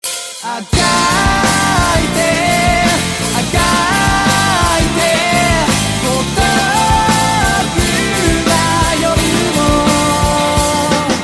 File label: tuba sad